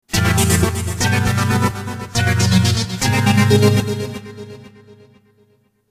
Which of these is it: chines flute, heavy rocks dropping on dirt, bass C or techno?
techno